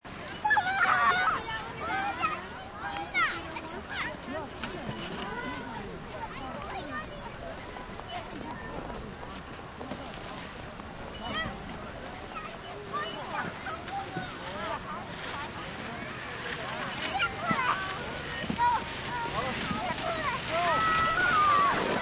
Ambience Tobogan, Tobogganing, outdoor, crisp winter day, close stereo mid-hill perspective; kids laughing playing, screaming and yelling, human voice